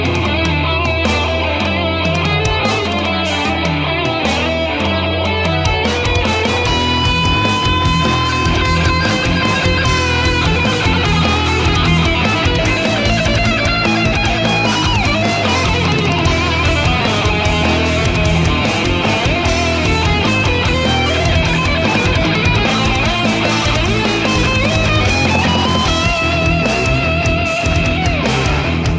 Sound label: awesome solo